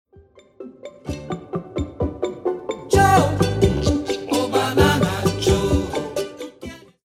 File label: ringtone